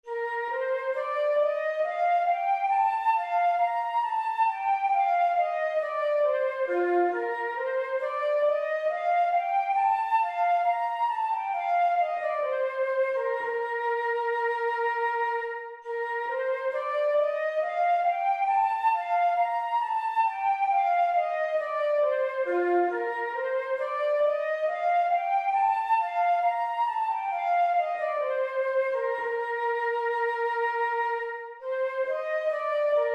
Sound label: gavott; handel